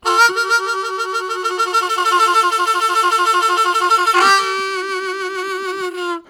Roll, Harmonica Riffs; Zero G

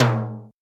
Lo Timbale; Zoom RT-123

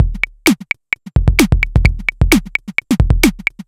Synth Loop, BPM130

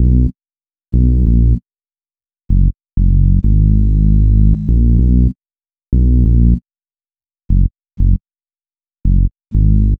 Bass, Hip-Hop

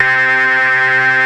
OSCAR C3, synthesizer; Hollywood Edge - Giorgio Moroder Rare Synthesizer Collection

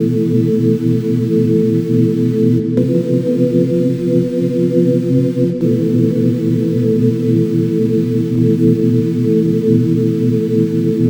Melody, Drum n Bass; KJ Sawka; Drum n Bass & Beyond

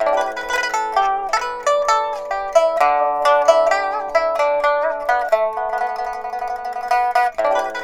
PIPA, 90; INIS - Opium

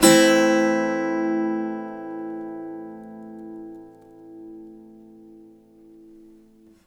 UP, Blues Guitar; ILIO; Fretworks

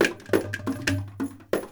INDIA L; Spectrasonics - Heart of Asia CD2

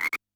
FROG; Yamaha DD-65

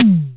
SDS TOM HI; Back In Time Records; Big Bang

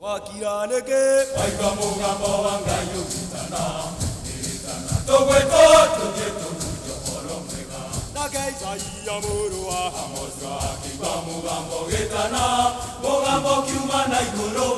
Heart of Africa; Spectrasonics; Heart of Africa 2

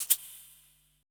E SHAKER, ETH PERC

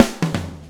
SWNG, SWNG SHFT

